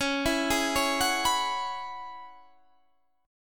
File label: Db Minor 11th Db down Guitar Standard; arpeggiated